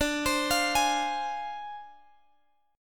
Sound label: D-Minor 7th Flat 5th, D, Guitar, Open G